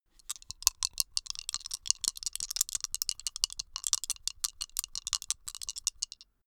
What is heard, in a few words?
dice shake hands closed long